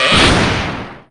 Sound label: bend staff hard; william